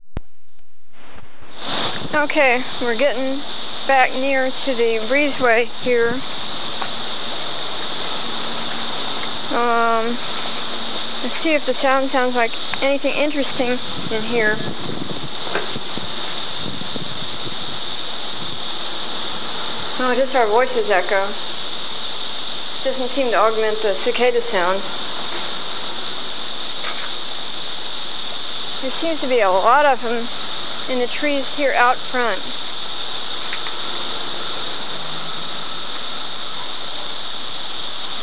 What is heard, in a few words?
cicadas